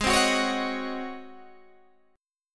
Ab 11th down Guitar Open G

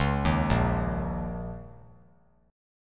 A sharp Minor Double Flat 5th, A sharp, down; A#mbb5 arpeggiated; JGuitar

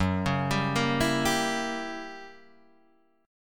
Gb 7th Flat 9th Gb Guitar Open D

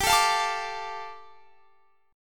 G 7th Suspended 2nd, Guitar Standard; strummed; JGuitar

